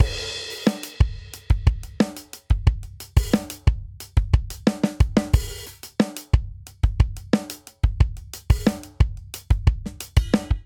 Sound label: Stadium Rock Verse Variant 90 bpm; 4 4; MIDI Clips; Ableton; Factory Packs; Drum Booth